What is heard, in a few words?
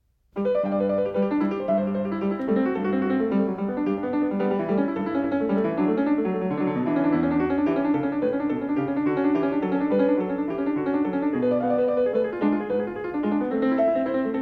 Bach Prelude in G Magor